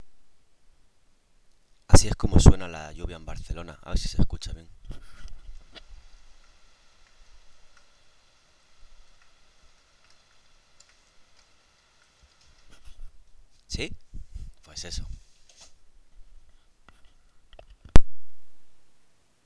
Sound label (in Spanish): lluvia